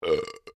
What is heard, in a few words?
MALE BURP MEDIUM; Male burping; Human